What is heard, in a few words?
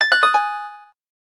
harp, keytone; SKY IM-A760S-VEGA-Racer